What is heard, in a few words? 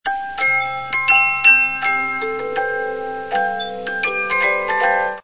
The Trout, 72 Note music box